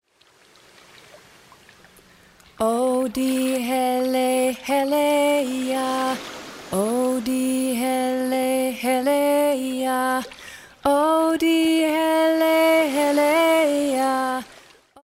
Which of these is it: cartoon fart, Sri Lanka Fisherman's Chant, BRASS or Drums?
Sri Lanka Fisherman's Chant